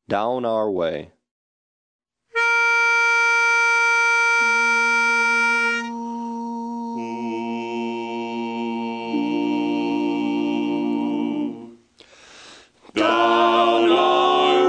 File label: Learning Tenor, barbershop harmony